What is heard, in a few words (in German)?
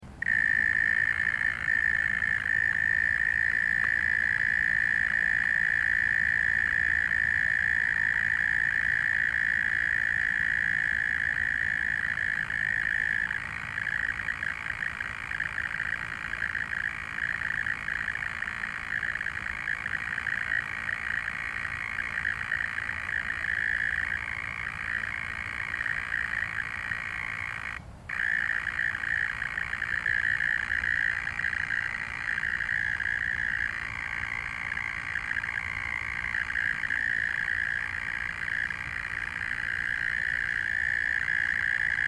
Maulwurfsgrille Tonaufnahme